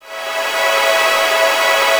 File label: PAD, Drum & Bass, 160 BPM